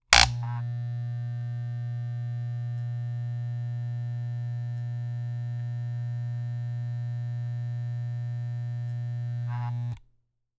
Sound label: Technology Electronic Clippers Hair Clippers Turn On And Off